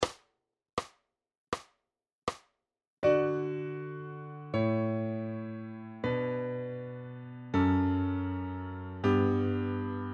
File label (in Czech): Kanon v D-dur; Hity klasiky 1